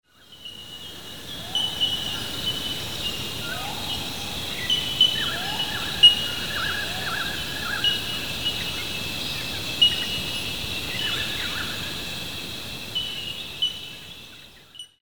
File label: Bell Birds Lyre Birds; bell birds and lyre birds of australia